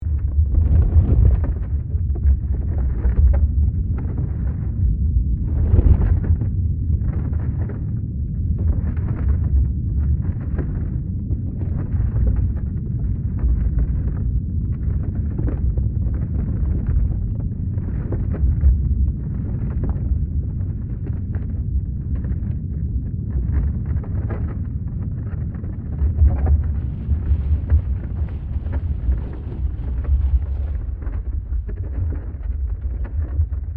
Earthquake seismic activity deep low rumble loopable